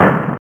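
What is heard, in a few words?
Percussion; Alone in the Dark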